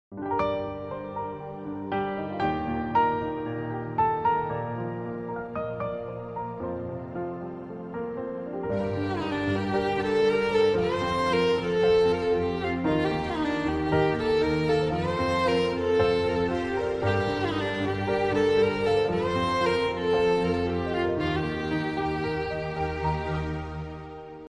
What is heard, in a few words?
piano